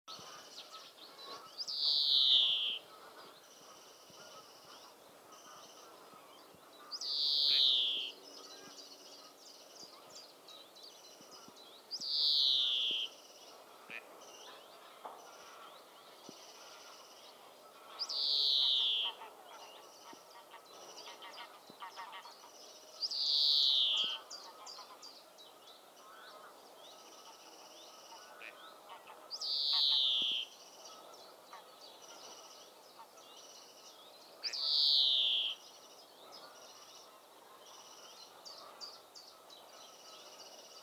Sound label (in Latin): Chloris chloris; SETE